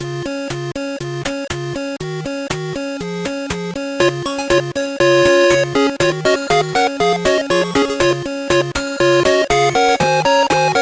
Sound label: chiptune